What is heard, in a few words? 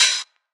Hat; M8; Electronisounds